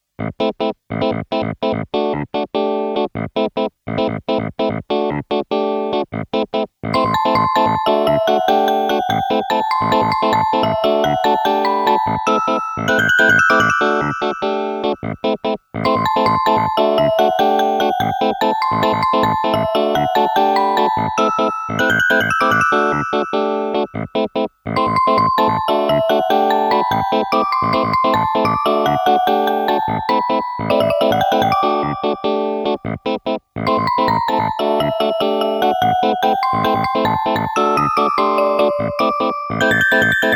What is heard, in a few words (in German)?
zwischenmusik